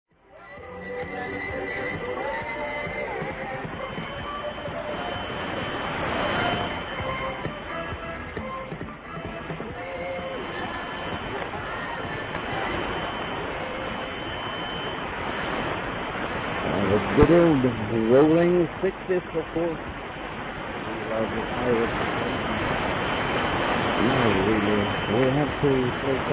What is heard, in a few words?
6308 kHz